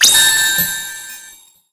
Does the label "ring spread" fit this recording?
Yes